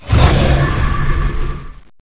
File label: player killing Spree Start